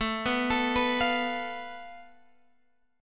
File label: A Suspended 2nd Sharp 5th, down, Guitar, Open G